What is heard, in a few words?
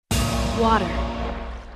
water; katara